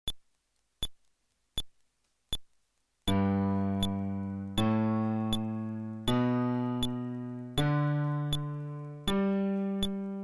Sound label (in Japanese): フレットレス (テンポ80)